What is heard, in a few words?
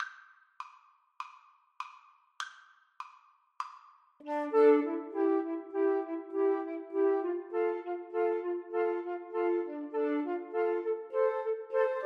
chabrier idylle flute trio